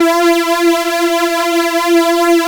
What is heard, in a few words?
Korg PS3100 E 3; Back In Time Records; Korg Universe VOL-1